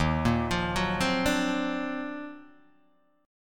D sharp Minor Major 13th D sharp, down, Guitar, Open D